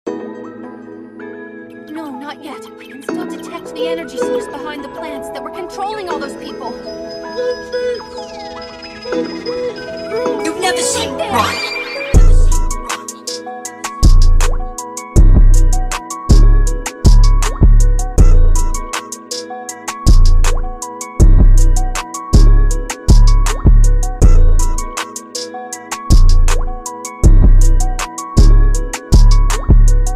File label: instrumental